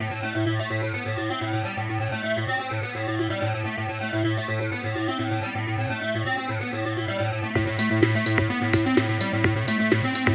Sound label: electronic; Avoid Sensory Overload